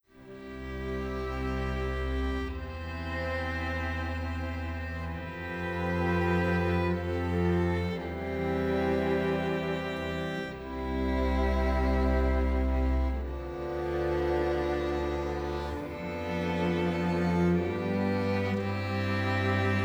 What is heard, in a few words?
String Quintet, Chamber Music